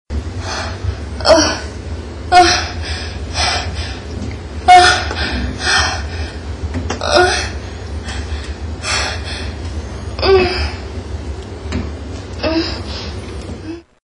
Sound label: orgasm